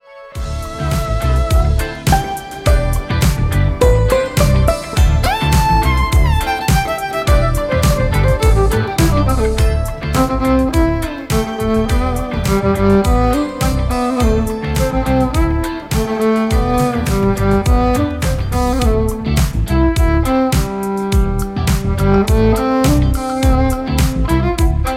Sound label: violinist; classical musicians